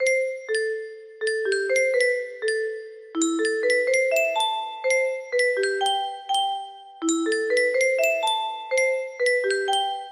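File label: music box melody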